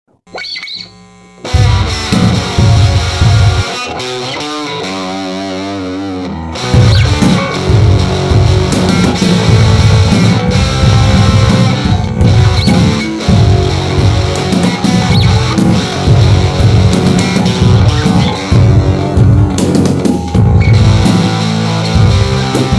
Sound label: instrumental